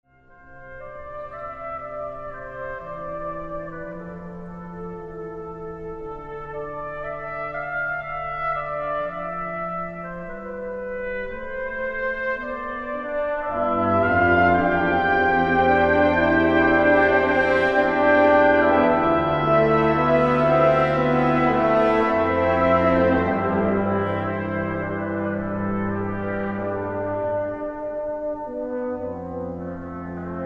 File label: Brass Quintet; Arcadia Brass Quintet; Nessun Dorma